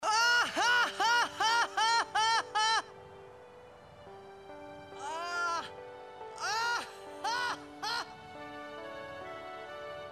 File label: Laugh; Final Fantasy X